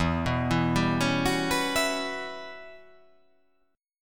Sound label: E Minor 6th Add 9th chord